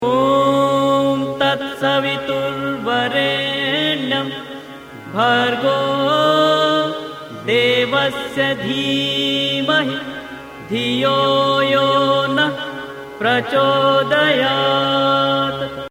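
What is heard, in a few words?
Vedic Gayatri invocation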